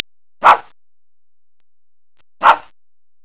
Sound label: bark